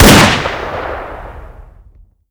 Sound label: deagle; weapons